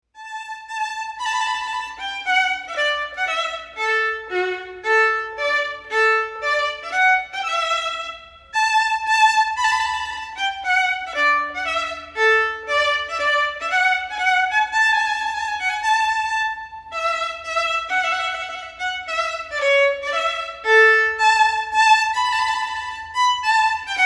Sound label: trumpet tune